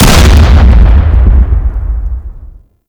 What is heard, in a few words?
Grenade Explosion; Warframe; Generic Grenade